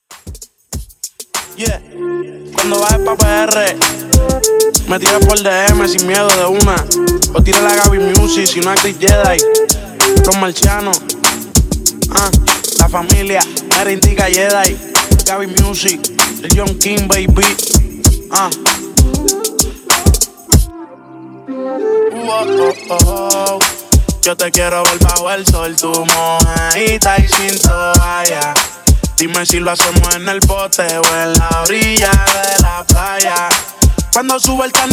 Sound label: Latino Redrum HipHop Beats 97 BPM